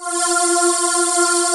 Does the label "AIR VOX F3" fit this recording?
Yes